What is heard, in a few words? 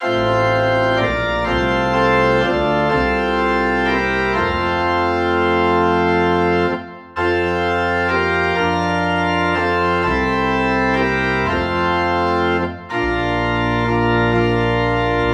Organ in F